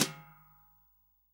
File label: SWING SNARE CHROMATIC, Real Drum Kit; REAL DRUMS